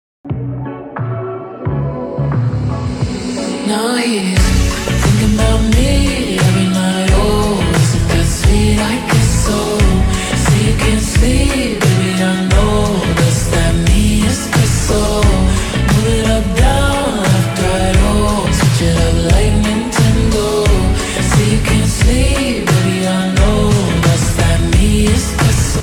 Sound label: Ringtone Slowed Reverb